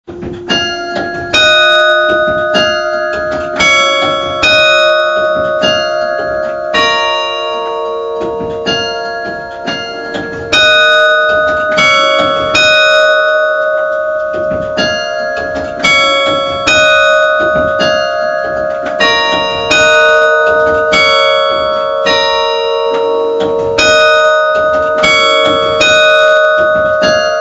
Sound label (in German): Glocken